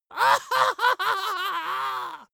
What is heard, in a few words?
dwarf laugh exaggerated